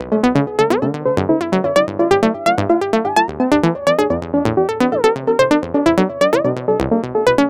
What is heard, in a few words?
Arp 128 Em